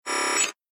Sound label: Computer Interface Telemetry Computer Glass Digital Scifi Robot Command; Tom Hutchings